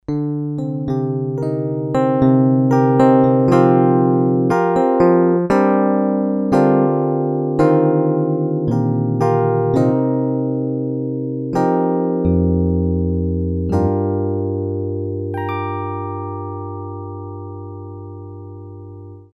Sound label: E. PIANO, Roland MKS-20